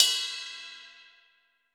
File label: Pop Ride Bell, cymbals; Roland BK-5